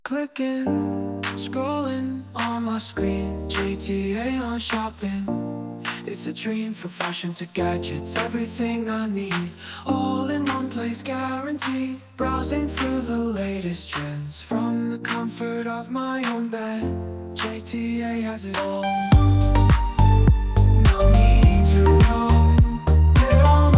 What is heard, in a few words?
Electro Groove